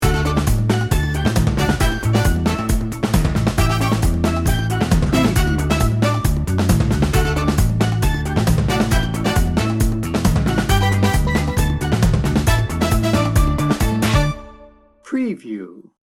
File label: tropical celebration